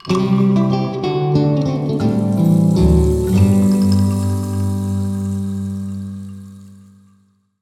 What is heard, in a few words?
bgm save; monster hunter 4 ultimate